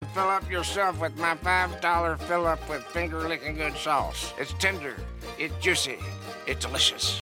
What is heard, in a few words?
Jingle; KFC